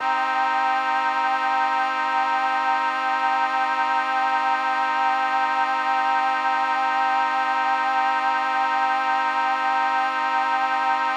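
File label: Mixed Winds C minor, synth orchestra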